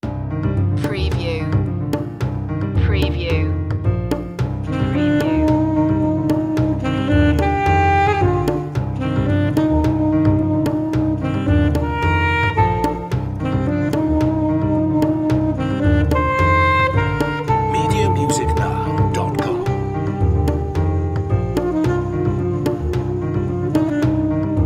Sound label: rhythm dance